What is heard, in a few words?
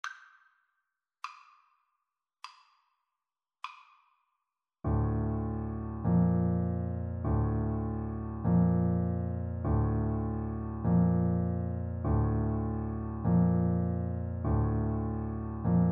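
recorder karaoke; chopin funeral march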